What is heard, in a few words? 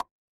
ui pop